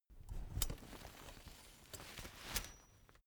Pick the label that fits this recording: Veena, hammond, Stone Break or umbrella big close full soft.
umbrella big close full soft